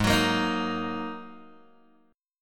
G sharp 7th Suspended 2nd G sharp, down, Guitar, Open G